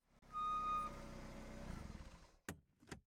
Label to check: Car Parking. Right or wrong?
Right